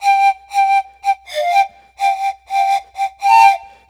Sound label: Thai Pan Pipes; Ambient; Clean Flutes